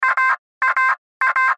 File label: Radio Morse Code; Morse Code Weak Signal End Sentence Aaa; Morse Code Sound Effects; Military; Blastwave; Warfare